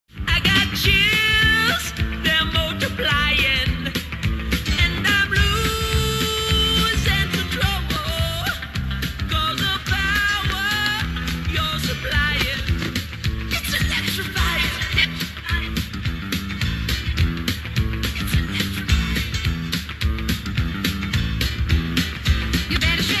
singing

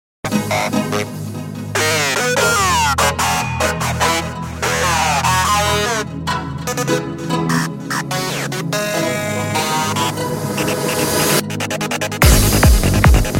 Trance

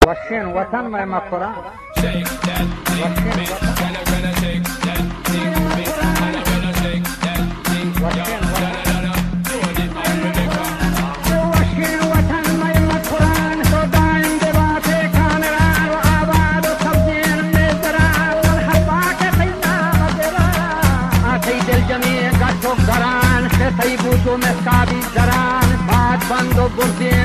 Balochi Remix

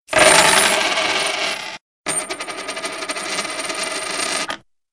Coin Rush

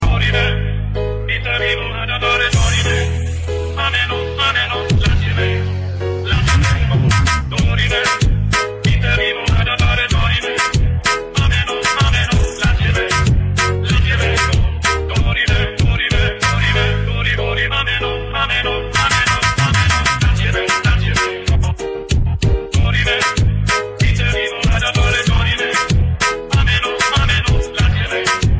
brega funk

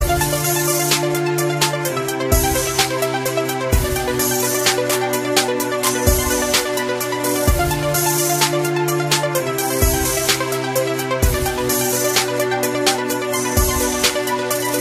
Arp

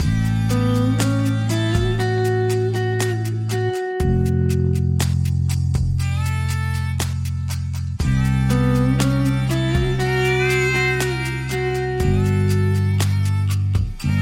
Calm ringtone

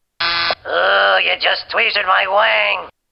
Sms Tweet; Krusty

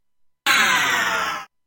Power Drop; Quake 2

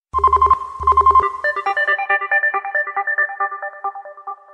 New Notification

